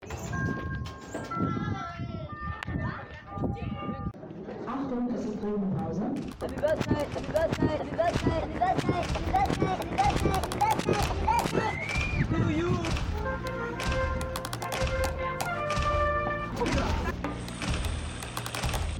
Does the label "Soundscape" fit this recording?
Yes